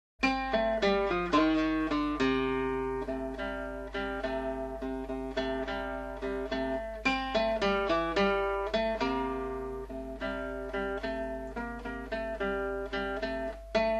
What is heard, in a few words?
Mini blues, guitar; Jolly Guitar